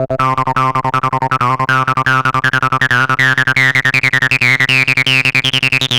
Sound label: Hardcore Loops Acid Loops, Dance Synths, 160